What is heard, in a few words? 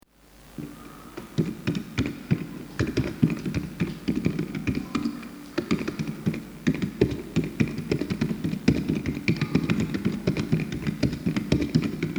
one claps; gallbladder